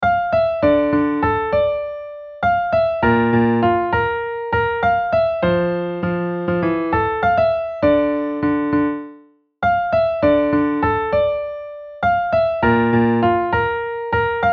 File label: Piano